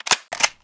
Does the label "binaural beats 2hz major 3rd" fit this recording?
No